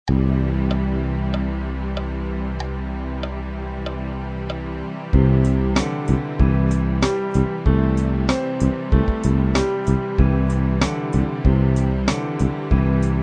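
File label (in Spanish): pentatónica mayor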